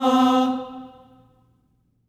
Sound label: STACCATO C4, Symphony of Voices; Spectrasonics